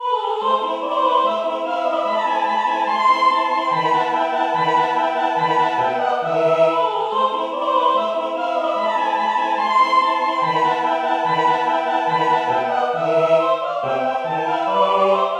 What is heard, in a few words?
ahh choir